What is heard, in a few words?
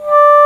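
Bassoon, D2; Digital Sound Factory Virtuoso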